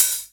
drum hit whistle

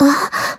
combo break

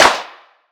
Clap; osu

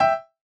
chorus chord G6 E6

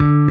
bass

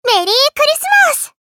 applause; osu

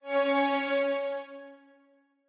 Strings

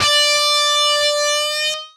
bend D6

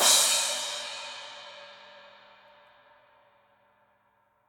cymbal

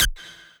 lead

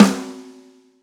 soft hit clap

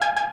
synth; osu

